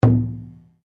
Drum Taiko, Japanese Taiko Drum; Musical; Miscellaneous Musical

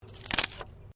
WOOD PLANK TWIST

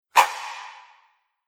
Single Dramatic Hit, impact; Miscellaneous Impact Sound Effects; Andrew Fleming

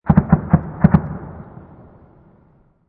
Mk19 Multiple Distant Explosions; Machine Gun; Machine Guns; VRSonic

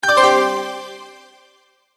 multimedia tone alert; Tones; Sound Design

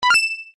UI Commit, User interface feedback sounds; Computers; Electronics

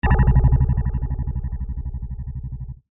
Pulse Ray, Scifi; Battle (Sound Effects:Science Fiction); BlastWave

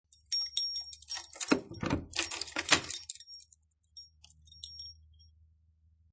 Rattle Keys And Lock Door; Doors; Miscellaneous Doors; Lynne Music

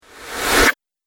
Positive Whoosh No Tail

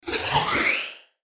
Logo Ascend Whoosh; Sonic Logo Sound Effects; Production Element Imaging Element Accent Transition

